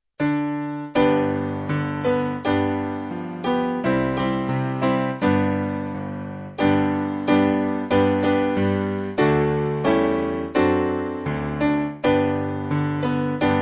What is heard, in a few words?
Piano